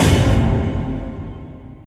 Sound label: hit